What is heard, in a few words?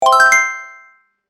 Correct